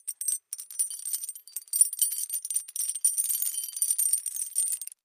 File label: Heavy Chain Movement